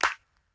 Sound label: clap; eddyflux